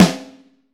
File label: HHT; Q Up Arts; Johnny.C's Vintage Snare Drum